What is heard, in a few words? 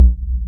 Kick; Samplepack Produtique